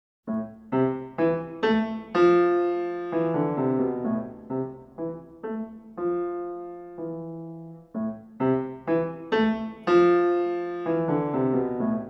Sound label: Cat Prowl piano